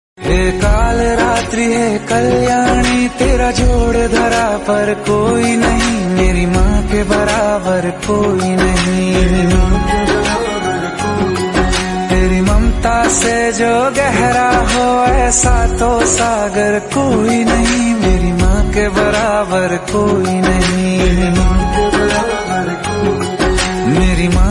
Hindi Bhakti Song Ringtone

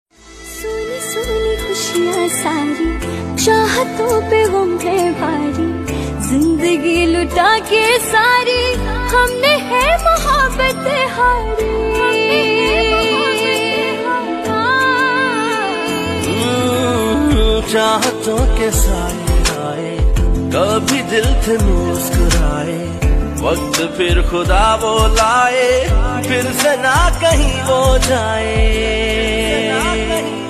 Sad Ringtone

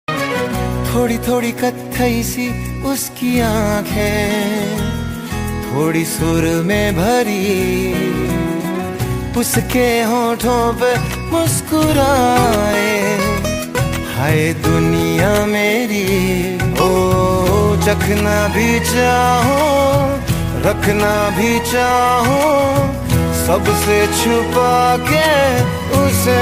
Sad Ringtone

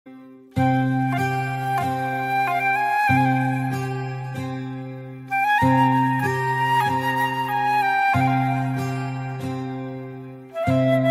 Telugu Flute Ringtone; ringtones

